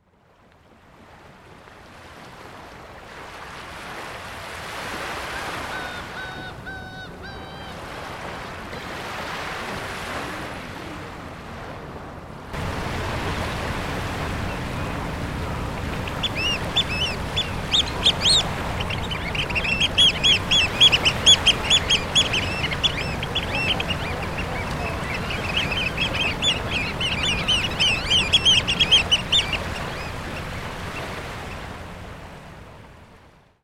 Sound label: bird